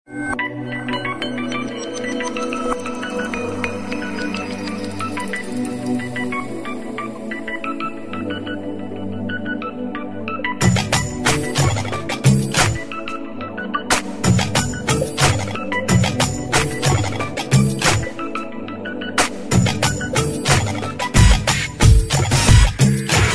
Cool Tone

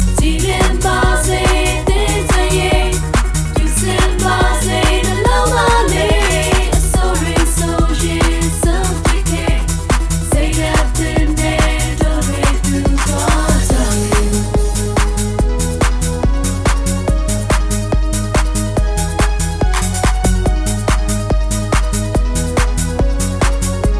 Lovely Dance Song

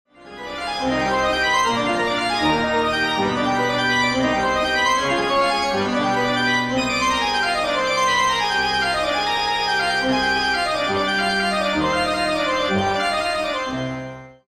Anthem Organ

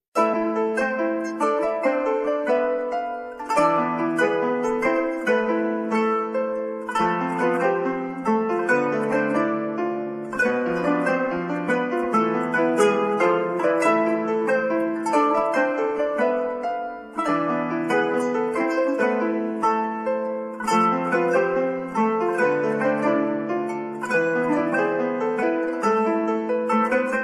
Happy

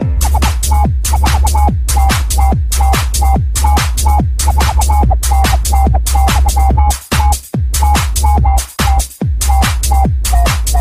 bassline uk

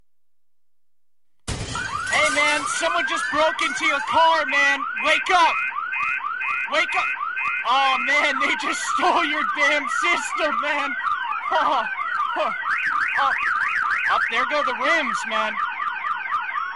Car Break In alarm

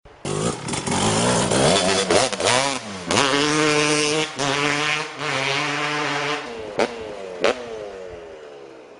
Revving

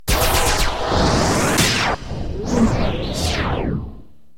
Sneak Sound Effect

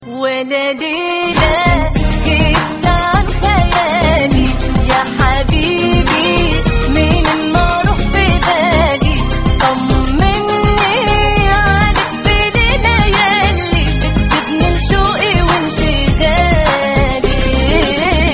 Arabic

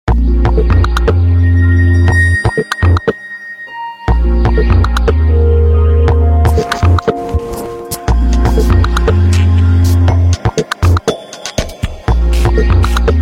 Best Bass Sms

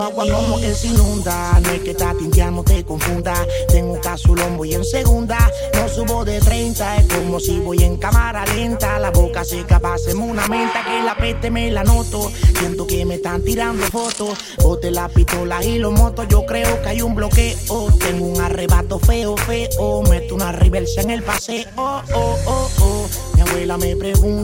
Hip Hop

